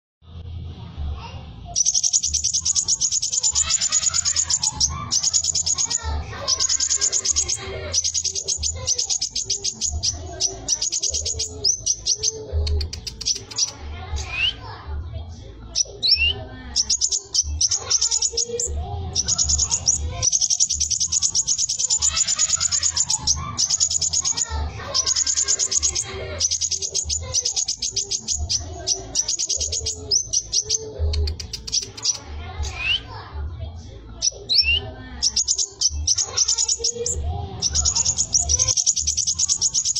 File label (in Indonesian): suara burung Kolibri Jawa